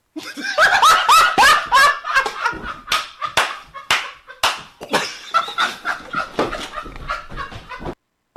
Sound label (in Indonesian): Ngakak Abis